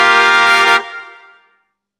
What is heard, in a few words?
fanfare